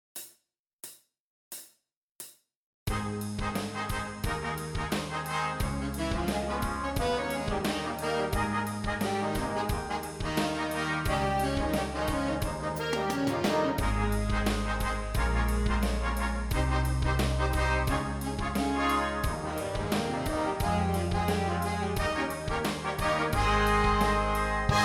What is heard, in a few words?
Advanced Daily Warm up D